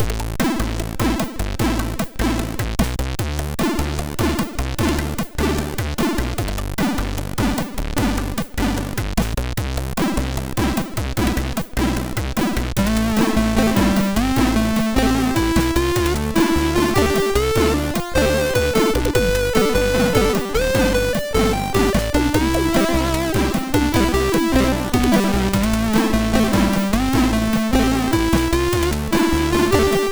sid MOS6581R3

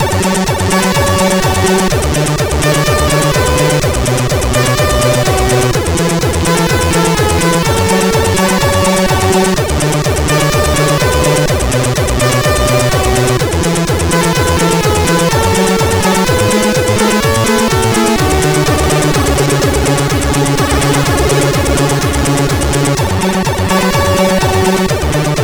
sid 8580R5; CSG8580R5

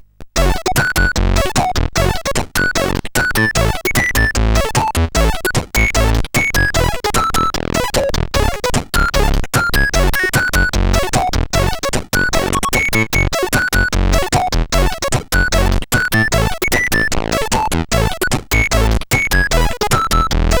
Hardsync, sid CSG8580R5

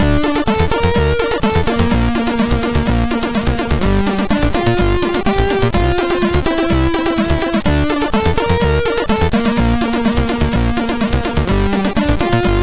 Eon sid MOS6581R2

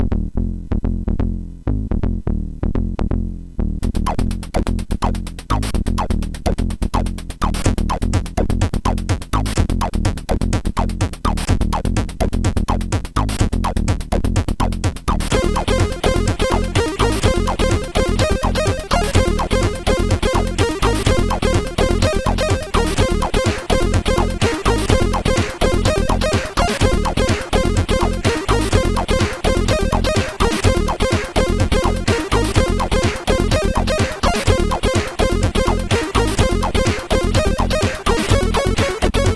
sid 6581

